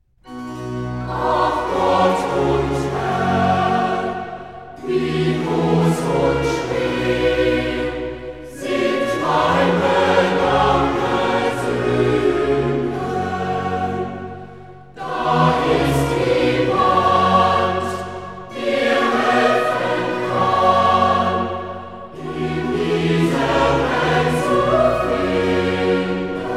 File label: Coro; Ach, Gott und Herr; Klassika; Handel Masterworks